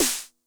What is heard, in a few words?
SNARE DRUM High Tune High Tone, 909; classic machines; 909mod